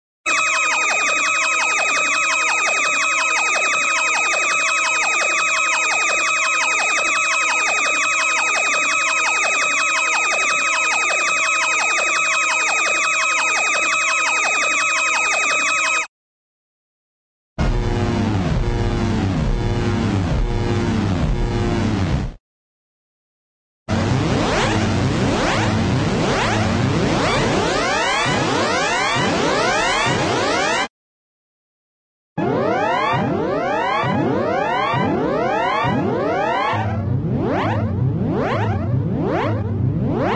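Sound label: electronic moog cycle; cartoon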